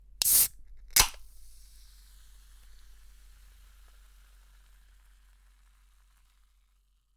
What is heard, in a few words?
tonic water can open